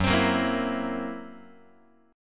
Fsharp 7th Flat 5th down Guitar; strummed; JGuitar

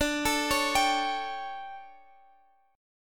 D Major 7th Suspended 4th, Guitar, Standard; DM7sus4; x,x,12,14,14,15; arpeggiated; capo=2

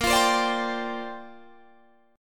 A sharp 6th Add 9th, Guitar Open D

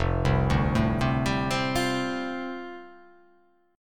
F 6th Add 9th F down, chord

